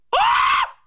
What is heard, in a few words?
bird